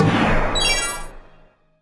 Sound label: Tier down; SFX Endcontent